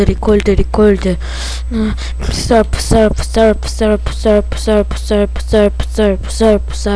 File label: surf